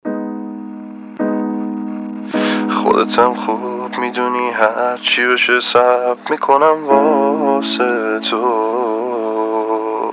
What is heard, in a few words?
Piano Version